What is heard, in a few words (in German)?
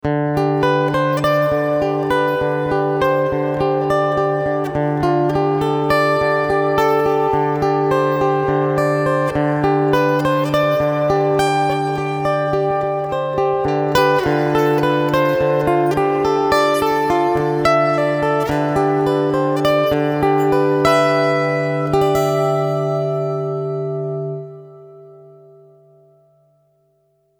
Hall